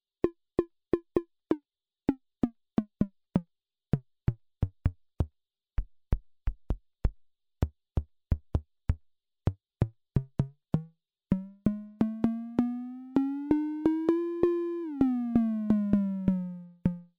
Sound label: DBI FM Drum